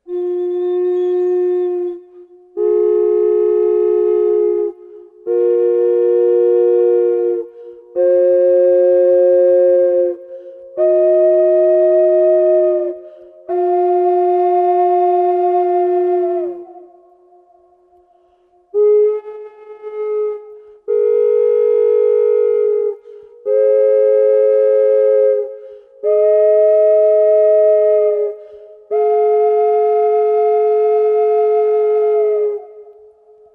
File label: double flute